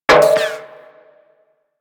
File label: Bullet Impact Debris Pipe